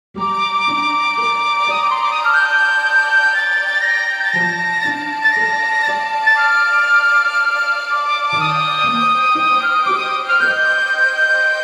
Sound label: Woods, Nokia Tones; Windows Phone apps